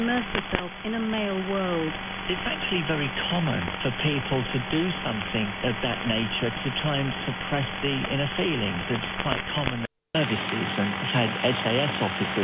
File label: 198khz AM; BBC; S2 PR